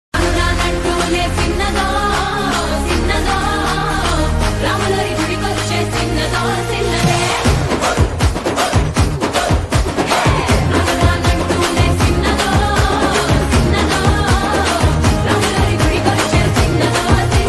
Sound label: mobile ringtone song